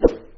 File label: rotate; blockgame